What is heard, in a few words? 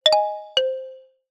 no problem, notification sound